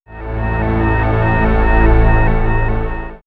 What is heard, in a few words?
Swirls, pads; X-Static Goldmine